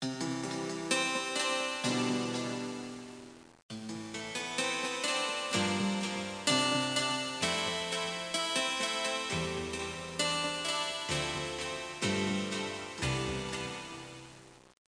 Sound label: sting